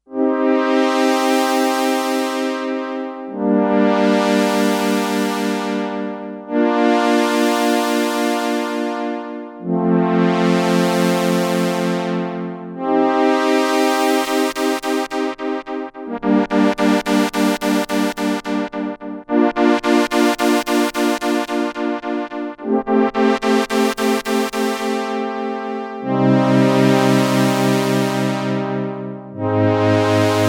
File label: String swell, Sequential Circuits Six-Trak; Factory Programs